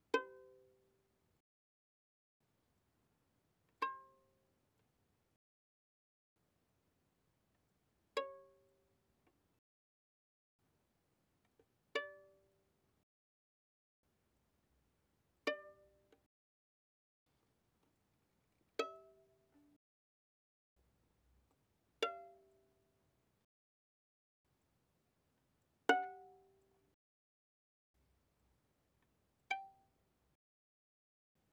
Violin pizz pp sul A C5 Bb5

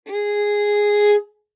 Violin arco ff sul G Ab4; MIS Pitches - 2014; Strings

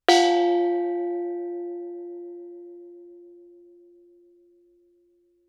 thai gong ff F4; MIS Pitches - 2014; Percussion

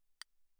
castanet, mf; Percussion; hand percussion; castanets

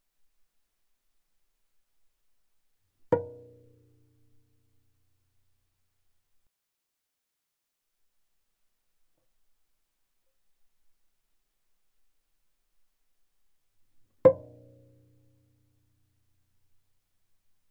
Viola pizz sul D ff C6 D6 stereo

